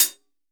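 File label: hi-hat closed, GM drum set; AKAI S-Series CD-ROM Sound Library VOL-8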